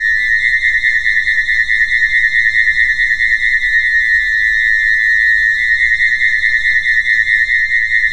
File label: DRONE, PipeClusters; E-MU Producer Series Vol. 5 – 3-D Audio Collection; 3DSFX